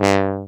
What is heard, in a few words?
BARITON; Best Service; Alpine Volksmusik